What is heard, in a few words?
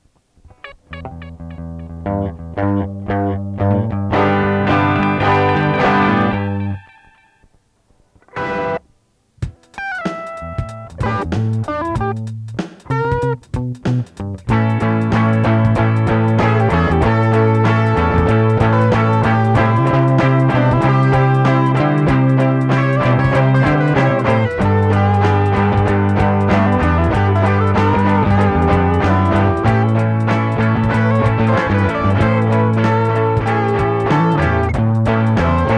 first rock song pedal drums; thumpity tump